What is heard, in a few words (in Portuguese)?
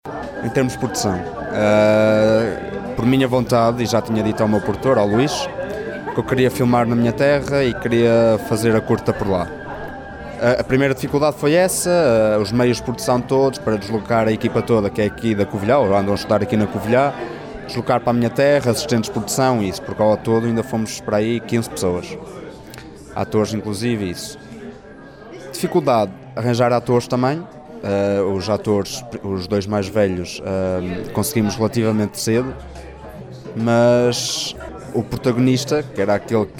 dificuldades arpeggio